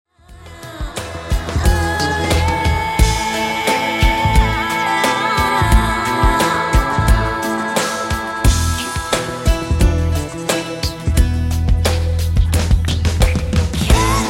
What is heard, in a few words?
Lullaby